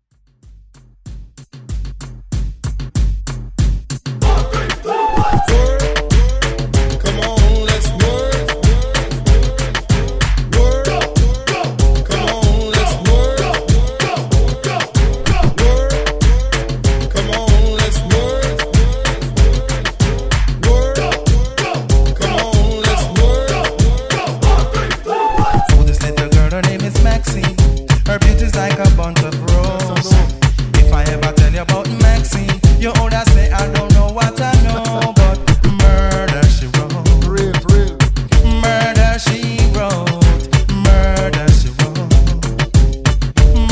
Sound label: Dancehall Redrum